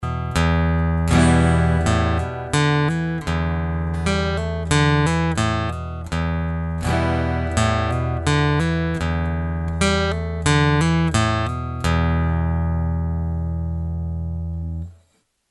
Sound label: ACOUSTIC HAMMER